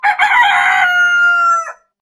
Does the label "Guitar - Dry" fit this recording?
No